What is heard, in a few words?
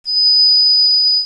trumpet